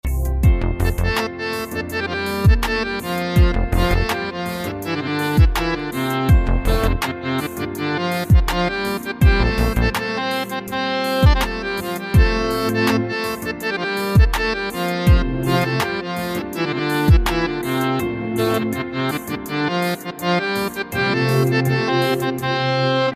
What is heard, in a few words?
Harmonium